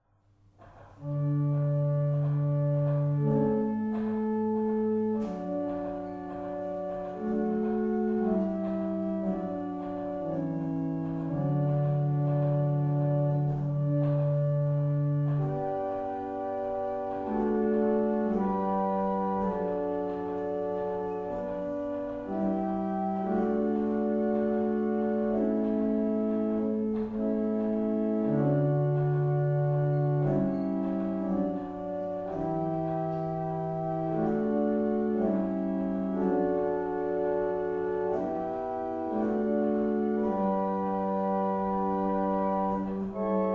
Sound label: Carlisle, barrel organs; Sutton Beds